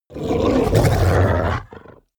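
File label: hellhound gnarl aggressive, creatures; kreaturen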